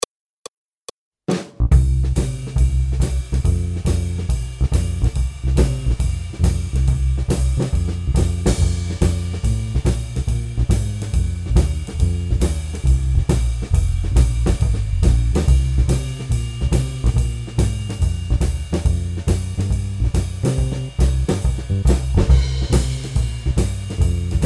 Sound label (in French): C & F blues, Accompagnement seul; Jazz